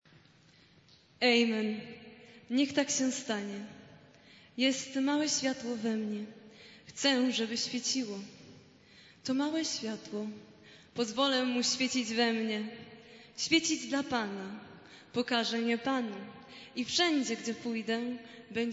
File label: chor gospel amen